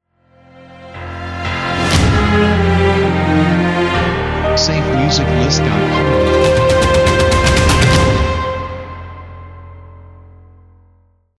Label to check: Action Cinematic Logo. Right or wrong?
Right